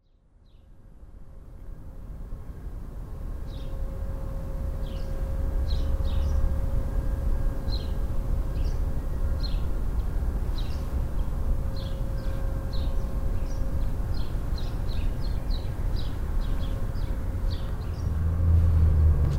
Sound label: New Years Bells; smallsounds